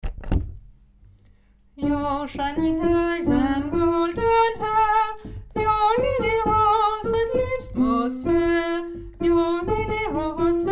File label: your shining eyes hommes